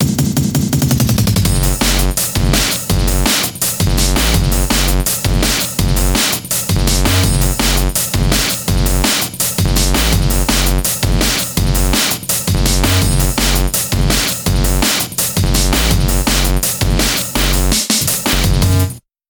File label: Dnb ring